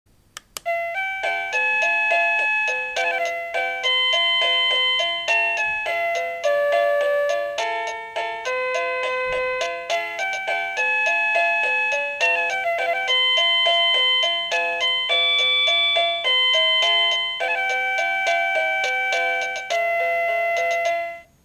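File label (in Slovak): Bezdrôtový zvonček melódie ukážka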